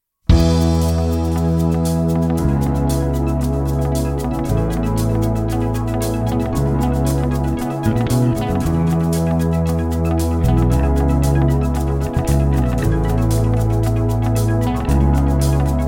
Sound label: pop medley